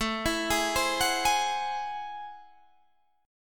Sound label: A Minor Major 13th, down, Guitar, Open D